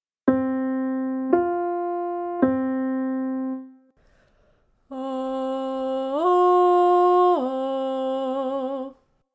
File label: Vocal Exercise